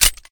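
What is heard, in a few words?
pistol 1911 first raise slide release; gmod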